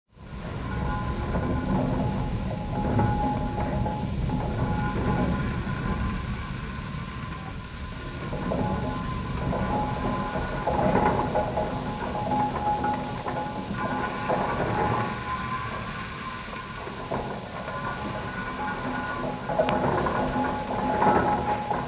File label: platines gramophone live studio; DinahBird